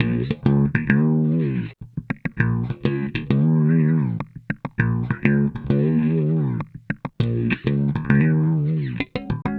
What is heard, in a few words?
Bass